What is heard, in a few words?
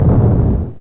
large explosion